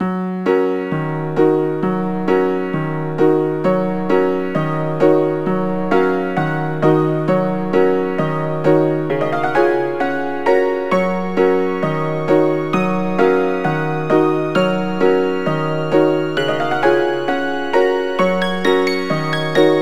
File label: Dance Chachacha Fast; Knigge Spielend zum guten Benehmen; NDS